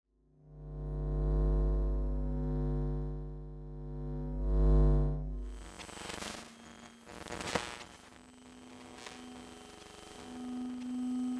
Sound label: RAW RECORDING EMF, microphone